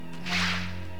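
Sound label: sword swing; Rayearth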